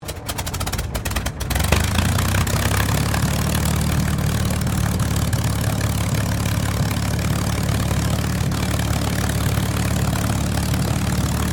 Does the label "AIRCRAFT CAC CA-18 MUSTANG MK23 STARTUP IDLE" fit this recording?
Yes